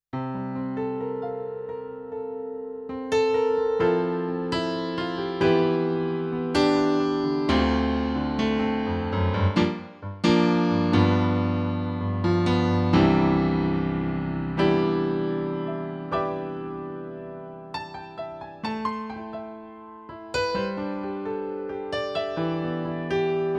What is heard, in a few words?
Piano